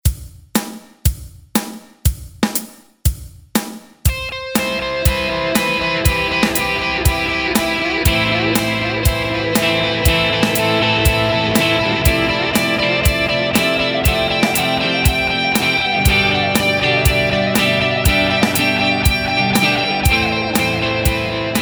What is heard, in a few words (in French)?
delay rhytmique; FX Story : le Delay